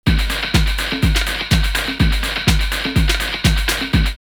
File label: Goa Goa 124, drumloops Techno&House; X-Static Goldmine